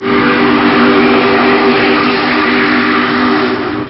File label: horn